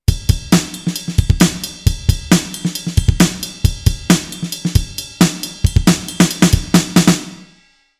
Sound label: Drums Drum Cave